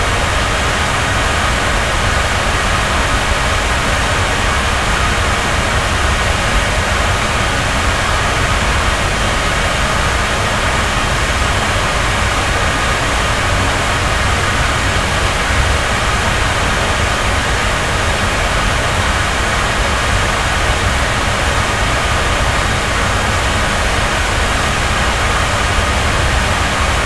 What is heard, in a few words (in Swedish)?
vokalist med storband